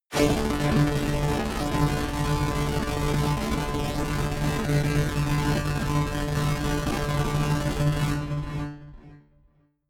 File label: cinematic resonator string; energie